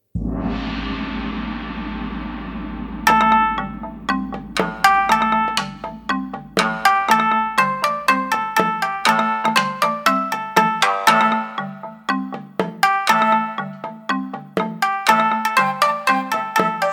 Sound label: Chopsticks